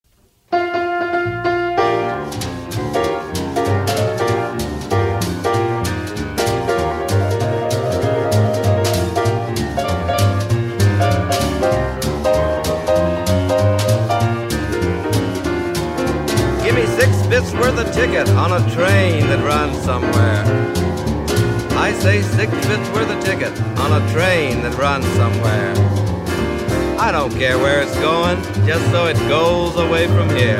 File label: blues; langston hughes